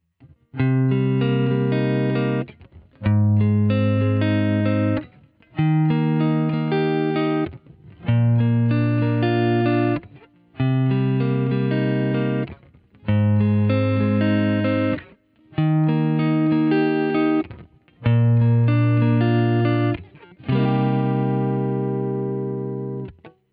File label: gt clean cable arp solo